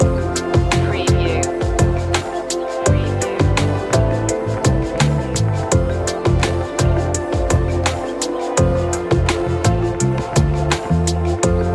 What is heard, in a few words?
warm universe loop